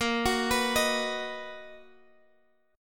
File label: B Major 7th Bb